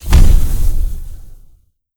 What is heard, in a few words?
Fire Burst